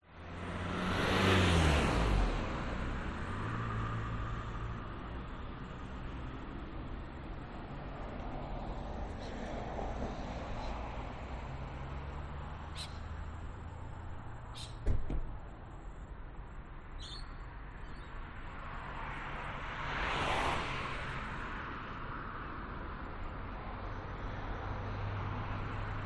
public places town traffic cars pass by quiet birds maroochydore australia binaural